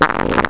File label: wet fart